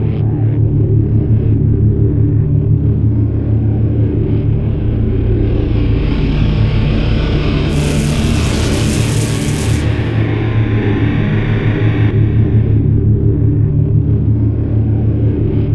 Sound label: core partial contain loop; hl2 ep1; ambient, levels, citadel